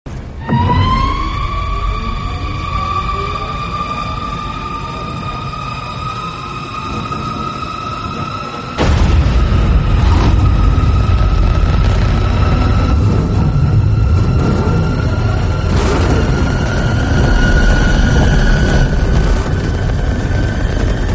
batmobile startup

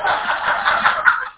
laugh track

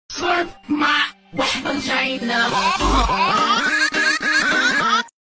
slurp

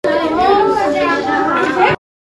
school bell

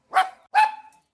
dog bark

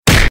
punch sfx loud; family guy version

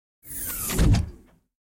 small door close

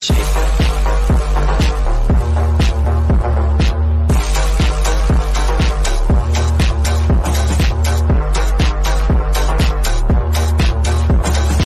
sigma walk

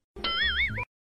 doingggg

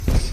teleport; umbrella academy

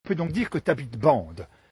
band

